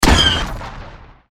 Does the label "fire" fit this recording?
Yes